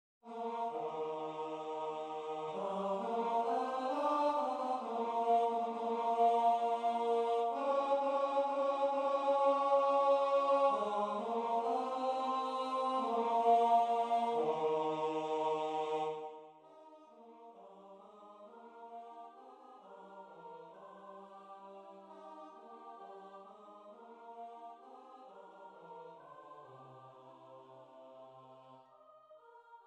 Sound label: bass; as dew in aprille